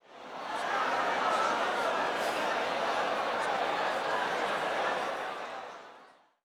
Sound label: Zawazawa Negative Girls